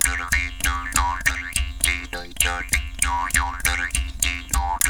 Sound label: JAW GROOVES, Didgeridoo; Big Fish Audio - Didgeridoo and Other Primitive Instruments